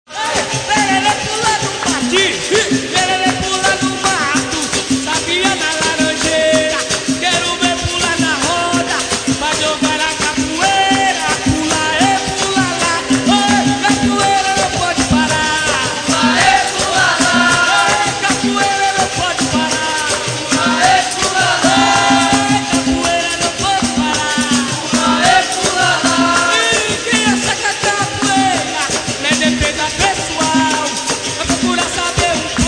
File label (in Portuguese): Capoeira Musicas; Esportes; perere pula no mato